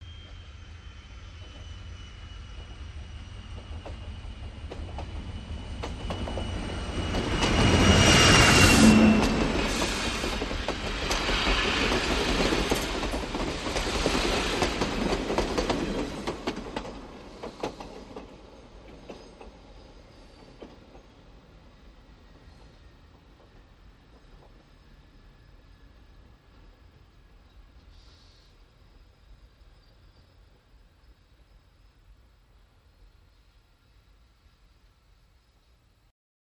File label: Diesel Train Pass By